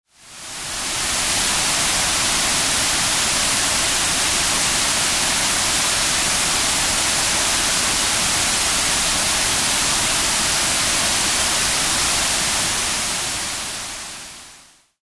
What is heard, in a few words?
white noise analog enhanced